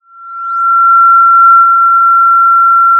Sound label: Sound of a police car siren